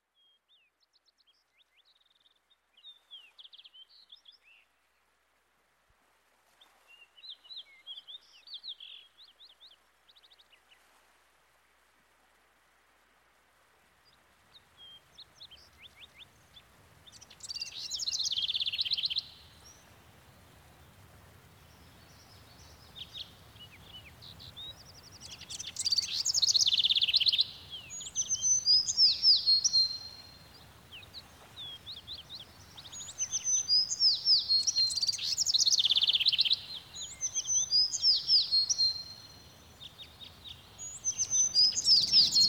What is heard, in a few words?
whistling of bird